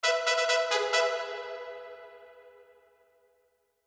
Charge Horns; fat princess adventures; ps4

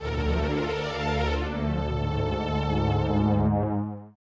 Defeat; Silva Saga 2; snes

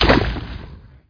volcano Splash; virgin

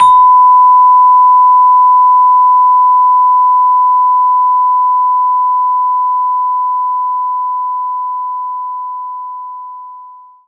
Vibraphone B6; knights try

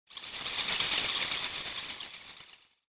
Bats

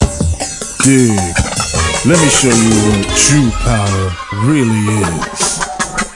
Exiting Cool Mode; PaRappa the Rapper 2

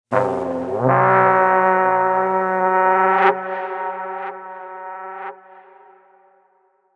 Battle Horn; Middle-earth Shadow of Mordor

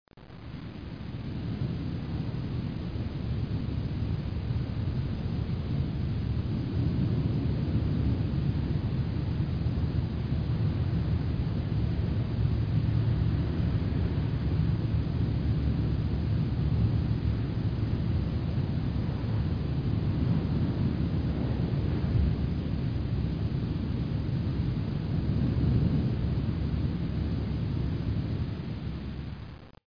Wind; legend of wolf windows 1997

